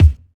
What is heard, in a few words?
smooth steel kick drum sound D# key, Royality free kick sample tuned to the D# note